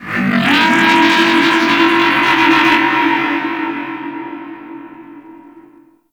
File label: Outpost Creature Robotic; Warframe; Corpus